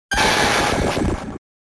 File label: Medium Enemy Out